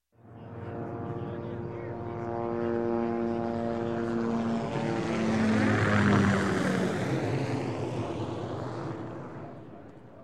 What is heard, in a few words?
Helicopter Hughes 500e Pass Overhead; Sound Ideas 2000 Series